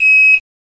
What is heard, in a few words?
REVERSE